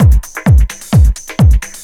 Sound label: drum 130 techno; Techno Trance; DRUMLOOPS; Techno Trance Essentials